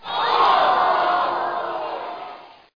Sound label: ADD GOLD